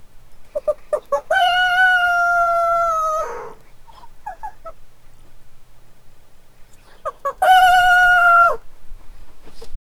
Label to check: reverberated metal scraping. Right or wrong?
Wrong